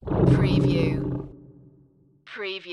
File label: Tiger Growl